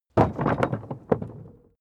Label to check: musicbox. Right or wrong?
Wrong